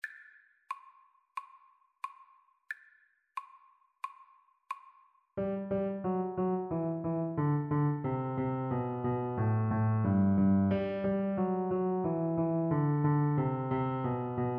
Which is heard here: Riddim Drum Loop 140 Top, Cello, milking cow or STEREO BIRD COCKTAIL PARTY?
Cello